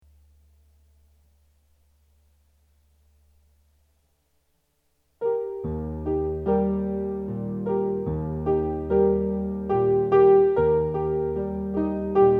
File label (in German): wiegenlied accompaniment